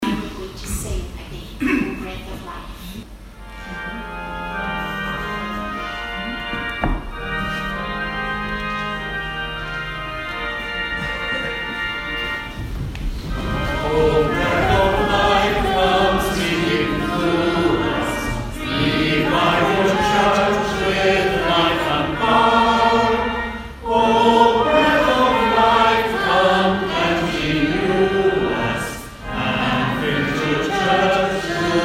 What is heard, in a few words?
hymn